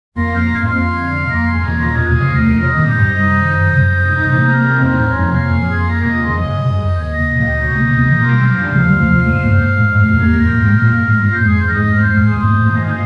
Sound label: circus music on a happy